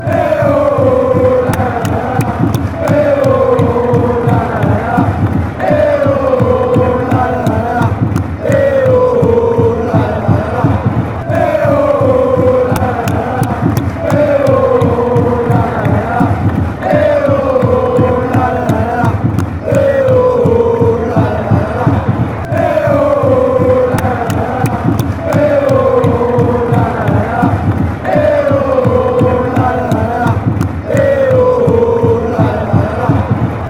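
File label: fanchants, football chants; Association Sportive de Saint-Étienne Loire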